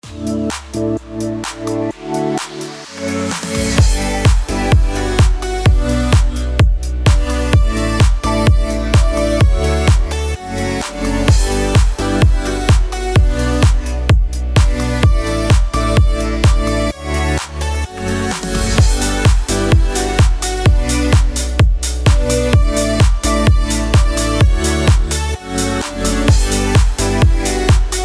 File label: CHRISTMAS ELECTRO GMAJ 128BPM